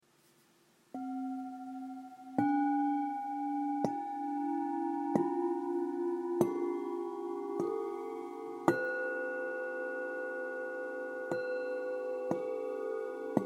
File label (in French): Set bols bengale